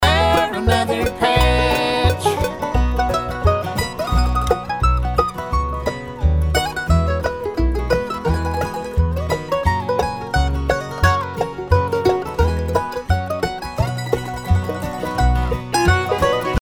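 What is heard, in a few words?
mandolin solo original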